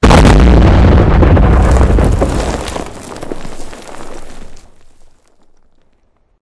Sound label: explode